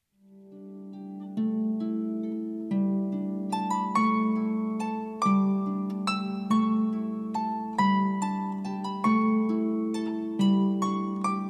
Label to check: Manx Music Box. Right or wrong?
Right